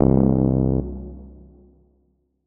notes, keyboard, electric piano

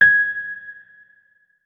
notes, keyboard, electric piano

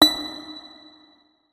notes, guitar; Hideout Furniture

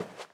paw earth, material; Divergent; Footsies

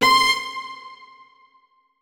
notes, keyboard; Hideout Furniture

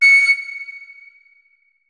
flute notes; Hideout Furniture

